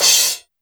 crash; East Collexion; Drum 1 Dry

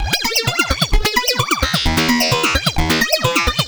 Random Robot Ring 130BPM

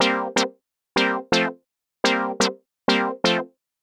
125 stab E, Keys, Nu Jazz House; PowerFX - Nu Jazz House

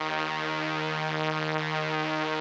505, String Machines; Best Service; XX Large Most Wanted 2; PARA-505

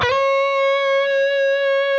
PRS PINCH, Guitars

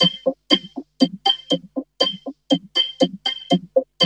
VOC LOOP, VOCODER LOOP; Zero-G Ambient 1

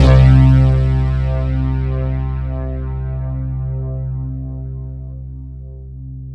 JV polysplit, JV-1080; Syntec; Wall of Sounds

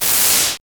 SYN FX; X-Static Goldmine 1

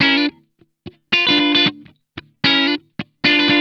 INTERVAL, Funk Guitar; Zero G - Funk Guitar

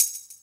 SHAKERS; Best Service; Dance Mega Drums CD2

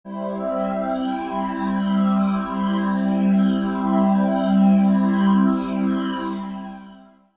Digi Pads; Jungle; Jungle Frenzy 1

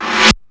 REV RIM; Hollywood Edge; The Big Bad Beatmachine; XR-10

